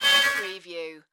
Digital Feedback Swell

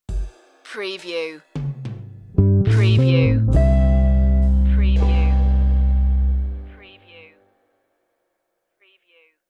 jazzy love stinger

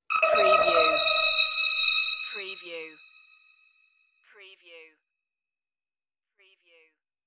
crystal interface beam

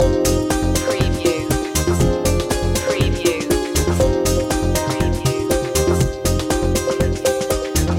turn on loop

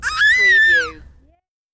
Baby Scream

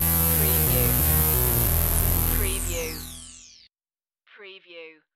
Hip Hop Synth Licks 90 BPM - E - Straight

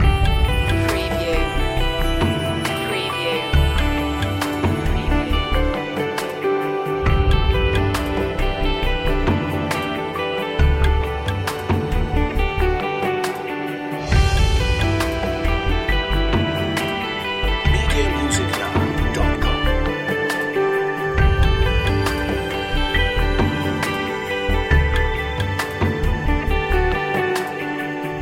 watercolours loop

